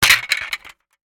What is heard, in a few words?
plastic toy drop